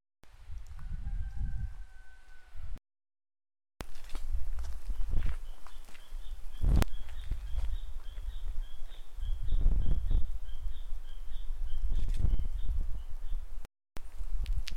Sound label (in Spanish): Sonidos Cutipay